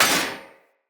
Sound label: Short Sword Thrown Impact Heavy Armour